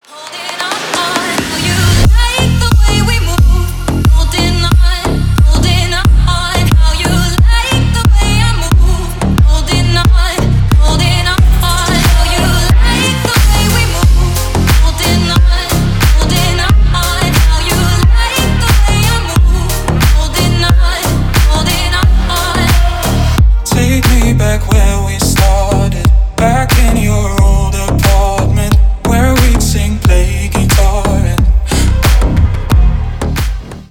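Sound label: loud club cut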